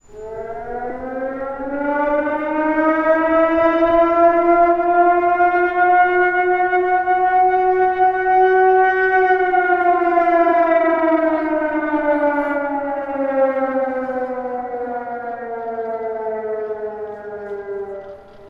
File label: siren; weather